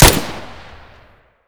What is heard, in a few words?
gyrza close; Escape from Tarkov